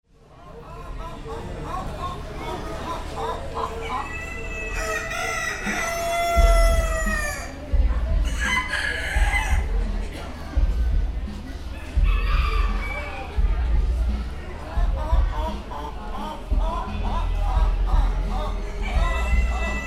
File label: Lebanon PA Roosters And Rockband